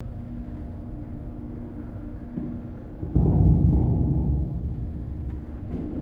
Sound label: quiet hollow rumbling inside an underground manhole